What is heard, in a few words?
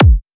Hardcore Kick Attempt